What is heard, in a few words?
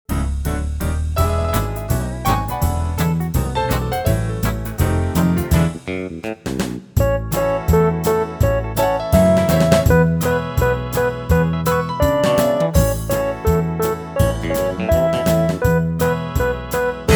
Tuneful Tune, String Songs